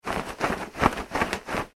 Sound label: clothing shorts polyester shake; Clothing - Shorts ( Polyester ) Being Shaken; Slides; Objects; McKinney Sound